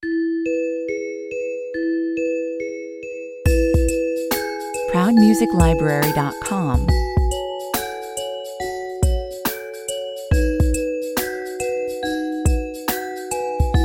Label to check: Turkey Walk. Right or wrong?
Wrong